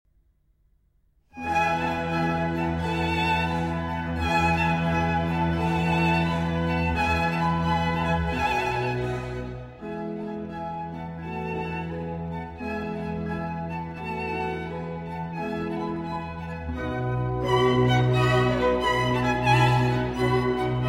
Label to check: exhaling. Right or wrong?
Wrong